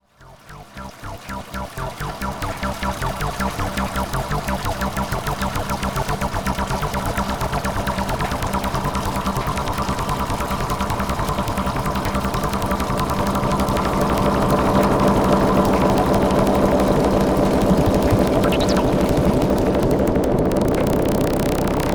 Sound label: Duet for Synthesizer and the Washing